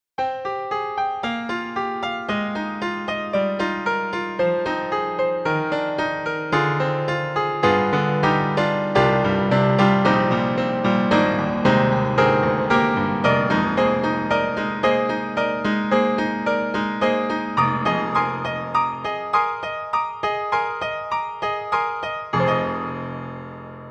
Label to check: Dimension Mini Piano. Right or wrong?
Right